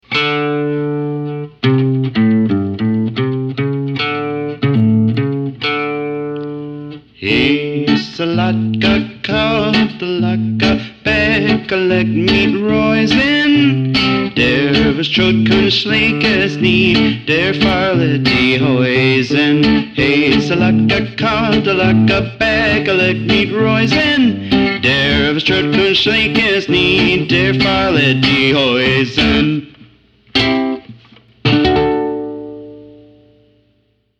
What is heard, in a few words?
vocal